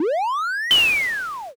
beam cannon

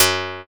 slap bass

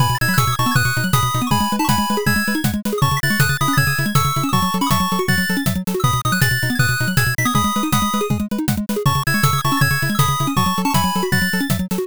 bgm drive

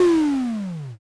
dark magic

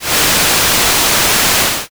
rain

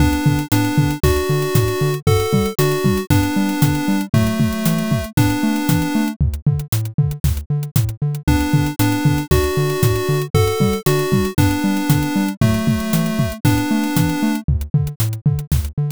bgm

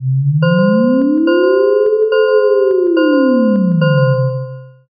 firetruck

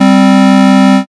buzzer end

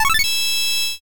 levelup big

